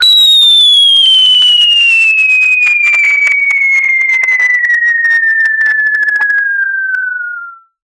a nuclear bomb falling, boom

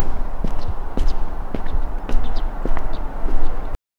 distant city ambience

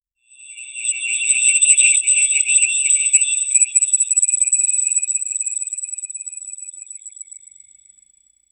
make me a transform sound, absorb

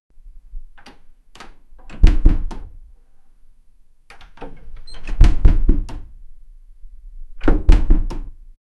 old wooden door slowly

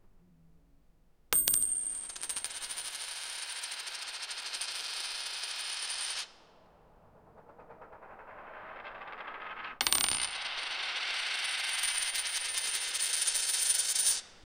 many coins are falling

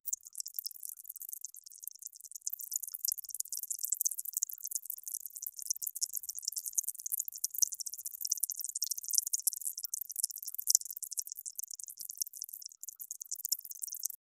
chatting sound

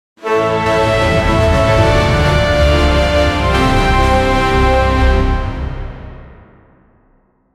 a grand epic orchestral

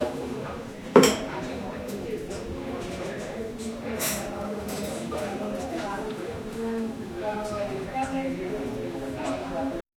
coffee shop ambience clink

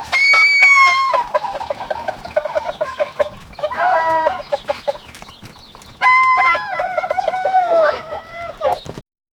chickens squawking villag

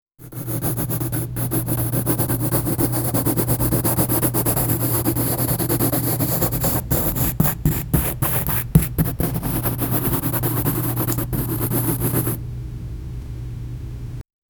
the sound of gently apply, food

